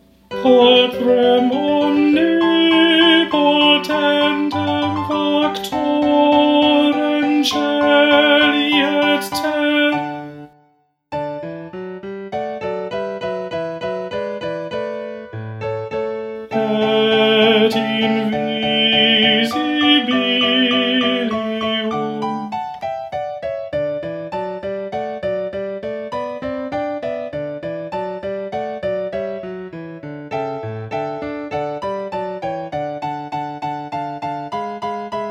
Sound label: Tenor